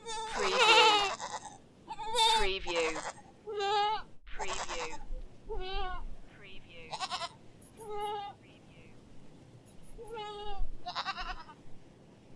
Goat - Various Baby Goats

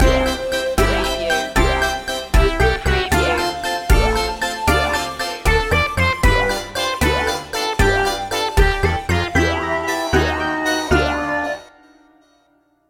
retro video game circus stinger

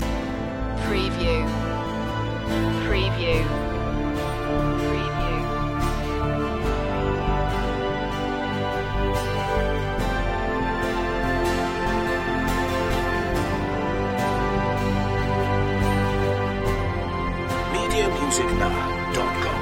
hope loop

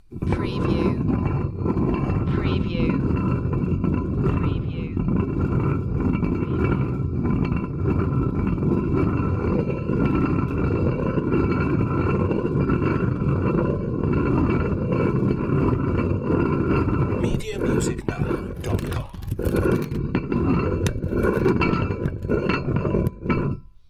Metal Drag Metal Grind Long; Industrial / Machinery

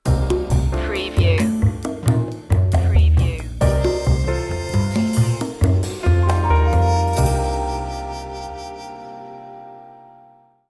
jazzy opener; variation a

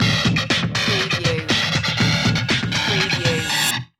remix loop

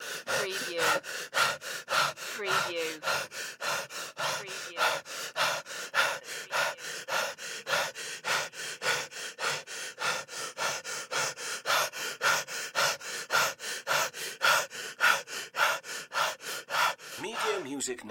Pant Male

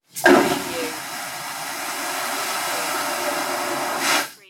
airplane toilet flush

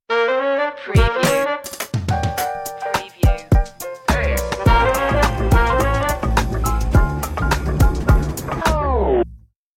comical detective stinger

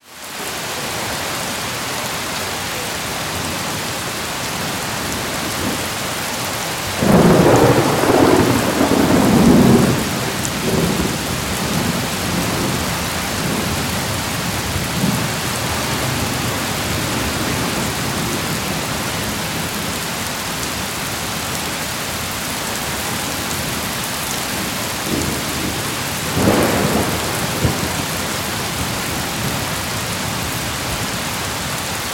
Thunderstorm Constant

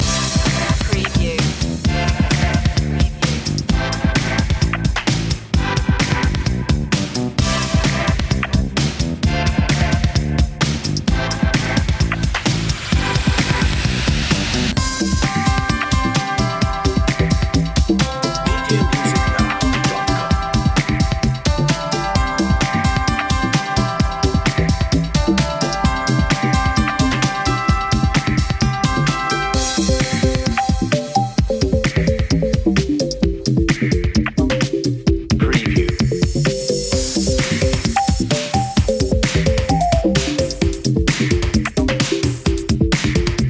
electro cool loop long; media music now

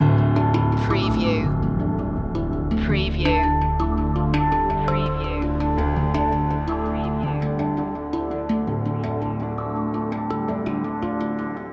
modern no electronic drums

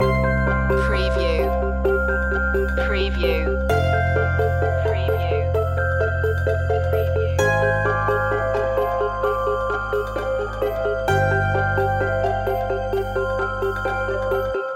the quirky traveller loop

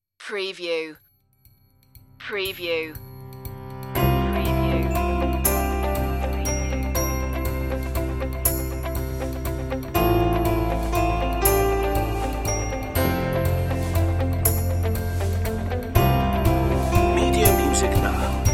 no lead guitar

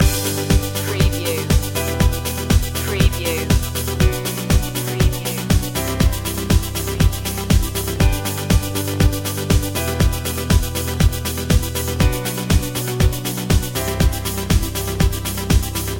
rise above loop

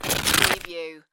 Snow and Ice, Hard snow or ice grate

